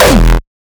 kick oneshot monster E; GhostHack

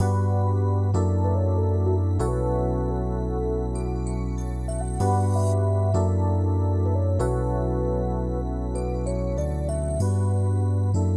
96 BPM B Min, Melodies, West Coast; Cymatics; GHOST (Beta Pack); Forever

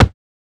Short foley

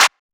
Clap; Drum One Shots

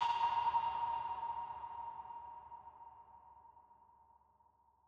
Tonal Impact A#; FX; Millions; Cymatics; GHOST (Beta Pack)

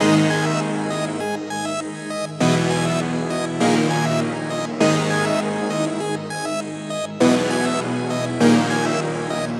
Melody G# 100, Hip Hop and Trap; Imposter; Ghosthack

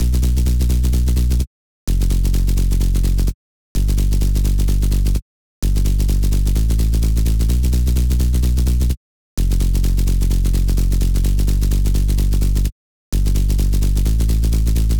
128 BPM B Min Sub, House; Drop Loops; Cymatics; Believer

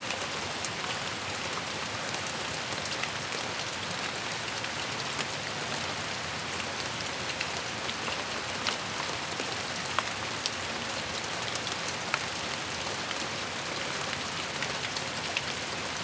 Rain; Cymatics; Eternity Sample Pack

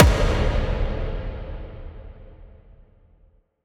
Dubstep Impact; FX; Impacts; Cymatics; Dubstep Starter Pack

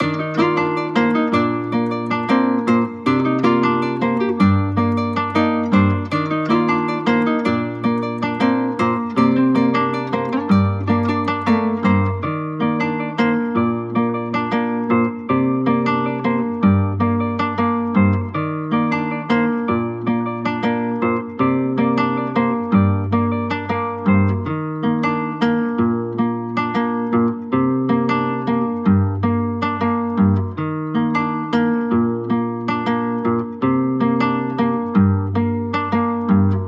157 BPM G Min, Guitar Loops; Around The Corner; Cymatics; Journey Guitar Loops

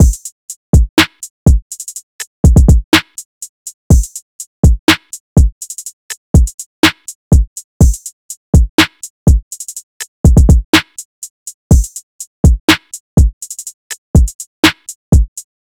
Drum Loop 123 BPM, Hip Hop; Drum Loops; Guard; Cymatics

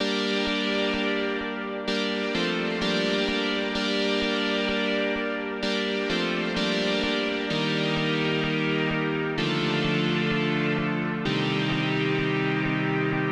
128 BPM G Min Chords, Analog; Melodies; Loop Stems; Cymatics; GHOST (Beta Pack); Archangel

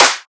Riddim Snare Clap

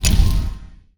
UI Fire Select; User Interface

